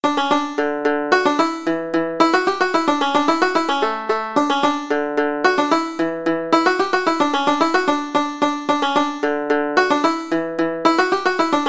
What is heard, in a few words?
Hornpipe, banjo; Bang Up